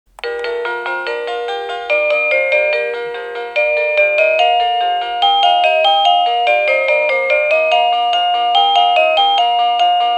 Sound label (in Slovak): Ukážka melódie zvonenia zvončeka